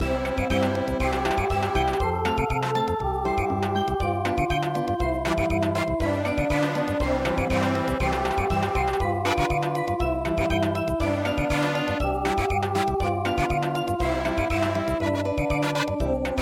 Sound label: Samba; Twinkle Twinkle Little Star